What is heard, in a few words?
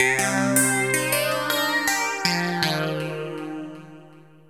ELECGUIT, AMBNT, 160, C; eJay; Ambient; Guitar